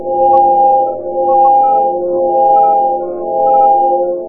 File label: machine ring resonance loop; ambient; source engine; hl2; labs; video games